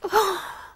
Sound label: sigh girl; ooh ahh; human